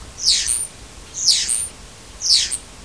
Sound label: starling